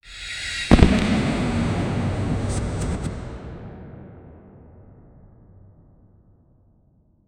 dragon lightning

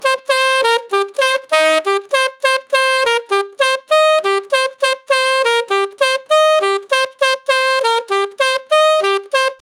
saxophone playing 100bpm, jazz

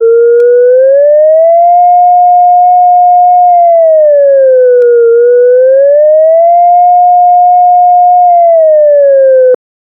alarm loop very realistic; alert

